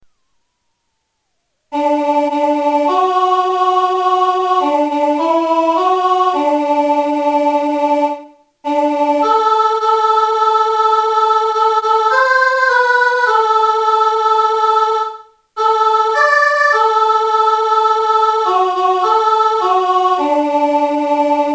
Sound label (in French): soprano; Chanson traditionnelle des Appalaches